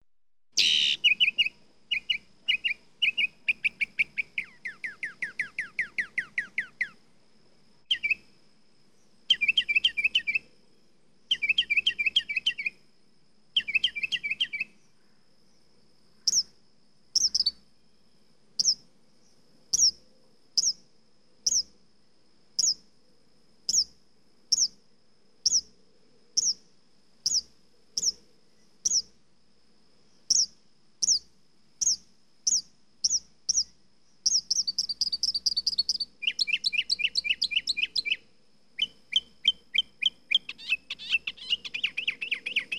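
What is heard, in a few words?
mockingbird singing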